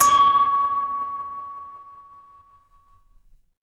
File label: instrument singing bowl